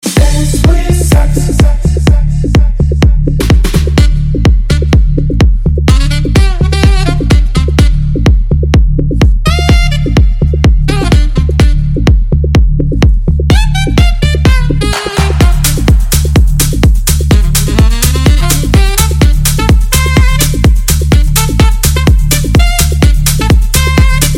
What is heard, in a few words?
syntheticsax sax